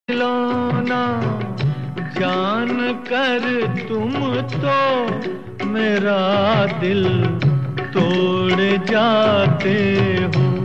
JANKAR, Hindi old Sad Ringtones; KHILONA; Hindi Movie Ringtones

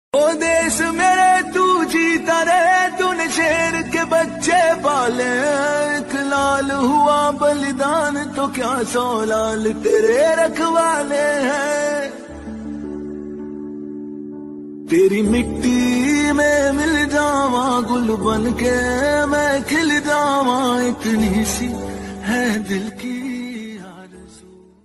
Independence Day Ringtone; 15 August Special

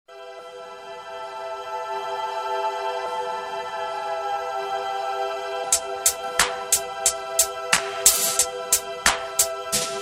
Ambient Chillout Ringtones

